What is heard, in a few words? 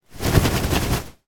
foley shorts cloth shake; zapsplat